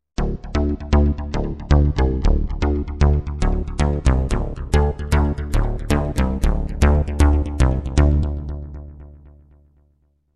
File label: BASS